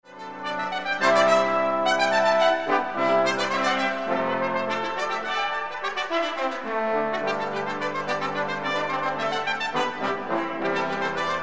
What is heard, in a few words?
Toccata Fanfares; Iannaccone